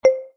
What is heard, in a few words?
soft no solute; molarity